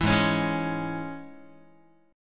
Ab Suspended 4th Sharp 5th, Guitar; strummed; JGuitar

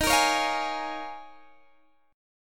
Eb 9th Suspended 4th, Guitar Open G; down

